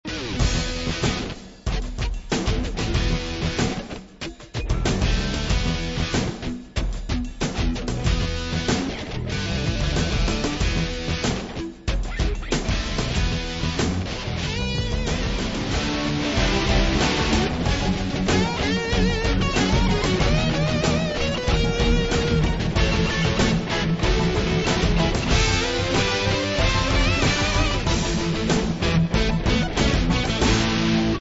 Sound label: heavy metal